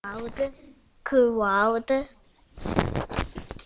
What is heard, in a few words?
crowd